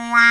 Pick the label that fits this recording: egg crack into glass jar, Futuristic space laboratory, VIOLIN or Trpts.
Trpts